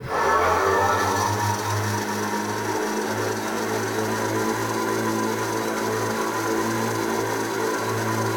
Aircrafts L-39 Seat Adjustment Up; DCS

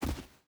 footstep boots sand jump land; Rust

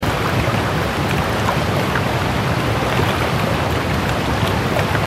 damage vehicle; World Of Warships

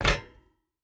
footstep hide metal jump land; Rust

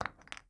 ui drop bone; Rust

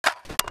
dragunov reload lift

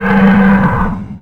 roar

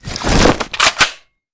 tactical spawn; Call of Duty Modern Warfare

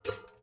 ui pickup metal thin hollow; Rust

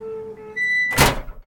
Amb Constructions; Red Orchestra Ostfront 41-45

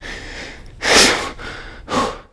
player climb breaths; Call of Duty Modern Warfare

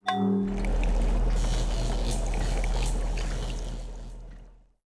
Laboratory; Buildings; Civ4

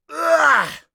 death; Escape from Tarkov

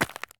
run concrete; Escape from Tarkov

